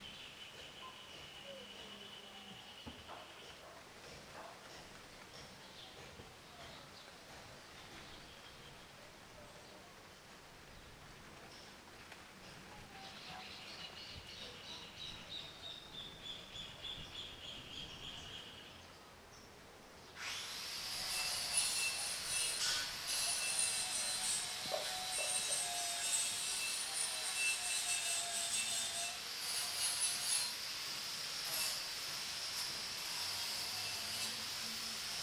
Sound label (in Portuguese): Serra; sao jorge goias